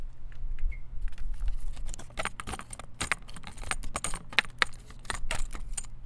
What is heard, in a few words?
Metal Rattling; BB Retail Therapy Sample Pack